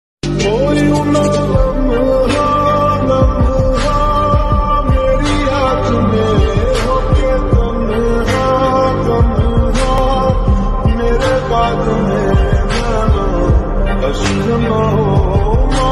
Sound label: lofi music